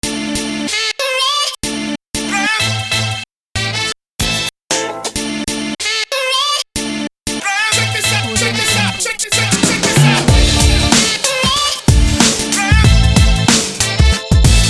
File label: trendy hiphop upbeat